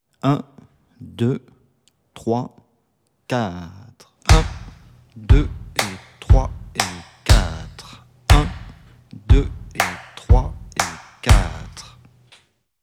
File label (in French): methode pour travailler un rythme 060bpm, guitare